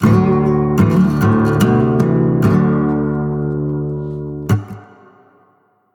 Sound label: complete sting